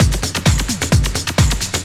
130 tribal, LOOP PERC TRIBAL; DRUMLOOPS

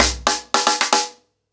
110BPM, SNARE TOM SOLOS; Sonokinetic - The Roots Drumloops; ACOUSTIC DRUMS

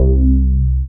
BAS, Eurotech; Sounds Good

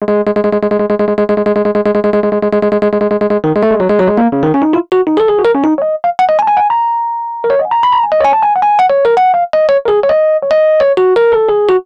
Rhodes Solo, Cinematic Funk; Cinematic Funk Urban Tone Poems

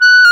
WND CLAR M, Clarinet; Roland L-CDX-03 Disk 1; Clarinets

